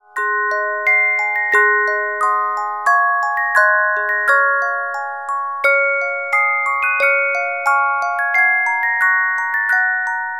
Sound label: Carillon Music Box Melodies